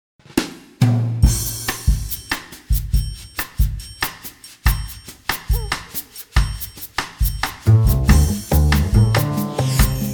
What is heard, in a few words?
nokia music